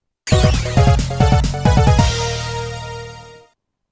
Victory, chiptune; Playstation; Mega Man 8

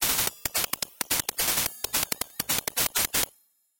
Round Clear; Ultimate Qix; Genesis

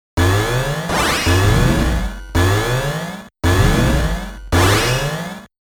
Warning, Chiptune; Nintendo Switch; Blaster Master Zero

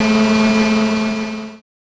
ROCK

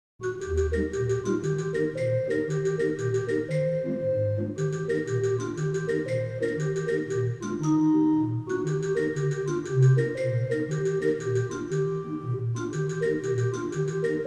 Going Cuckoo; Ren & Stimpy

